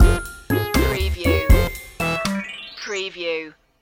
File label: retro video game lazy world stinger